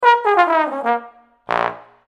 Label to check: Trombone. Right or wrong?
Right